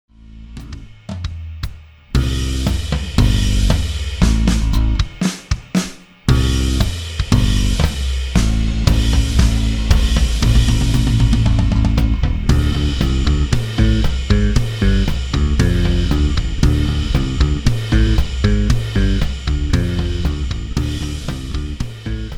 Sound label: No Vocals No Guitar